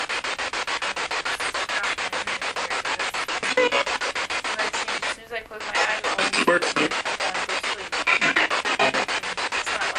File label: Spirit Box